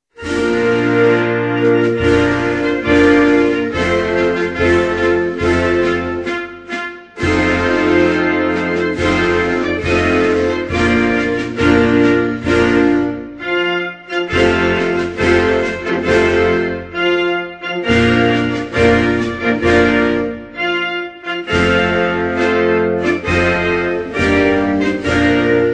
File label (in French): Oman, Hymnes nationaux